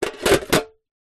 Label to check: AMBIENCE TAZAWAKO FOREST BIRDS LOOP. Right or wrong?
Wrong